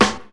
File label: good steel snare drum A key, steel snare drum tuned to the A note